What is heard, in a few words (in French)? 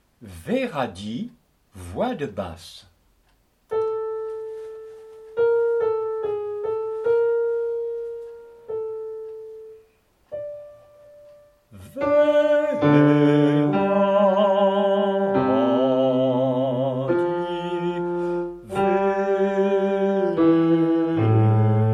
basse; Vehadi; Peter Deunov; SATB; concert 2009; Partitions et sons du concert du 15 août 2009